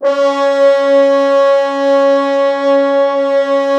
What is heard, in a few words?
C#3, Orchestral Brass